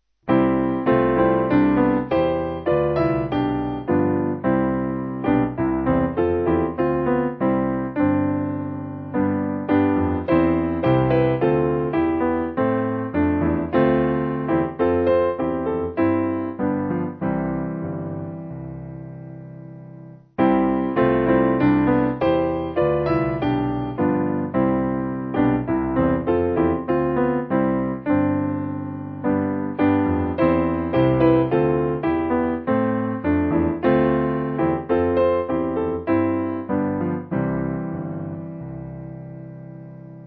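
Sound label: Piano, Music Backing Files for Churches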